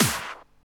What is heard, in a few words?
Clap; Vengeance Samples; VEC1 Claps